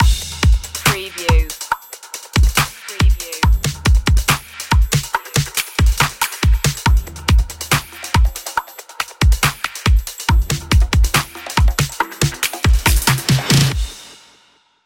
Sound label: hip hop urban drums